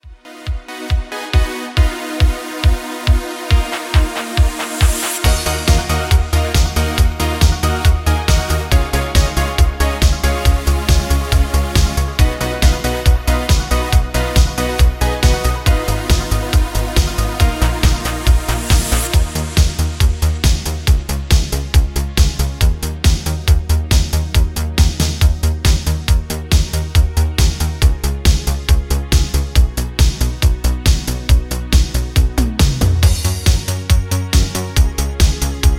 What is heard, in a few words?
Instrumental